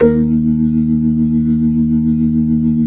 B-3, Jazz, Keys of the 60s and 70s; Roland LCDP10 Keys of the 60s and 70s 2

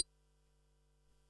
Perc, Percussion and FX; Pearl SC-40

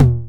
TR-909 Tom Low; drum machine; Roland TR-909

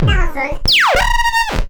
VOX ZIP, Anime Sound FX; COMIC

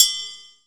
CHINESE PRC, PERCUSSION; AKAI S6000 CD-ROM - Volume 1

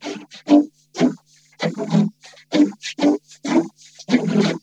HOGCODER, Funk Guitar; 90 s Sample CDs; Zero G

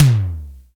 TOM SIMM; Roland LA; DRM Toms

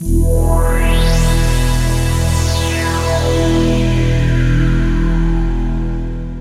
E, Drum & Bass Pads; Total Drum&Bass; 90 s Sample CDs

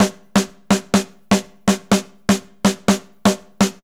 just a snare, Deep House 125bpm

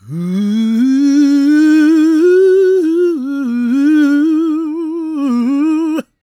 GOSP MALE, SOLO; Vocal Planet Vol1 Gospel

